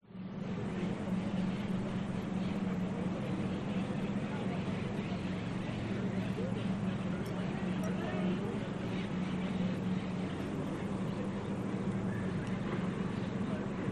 Spain Park; Audio Hero

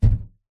foley balloon inflated hit impact thud

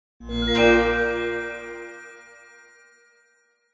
game sound tone magical

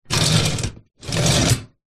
office metal wire tray drawer slide

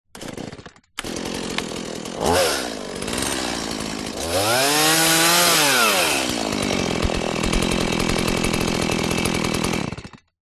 industrial chainsaw chord pull start rev off; zapsplat

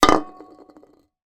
foley can beer empty down table wobble; zapsplat

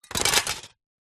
plastic shards broken thin pieces set down; foley

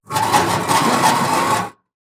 Just Metal Squeaks and Moans; soundbits

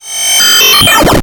digital shutdown; sound design

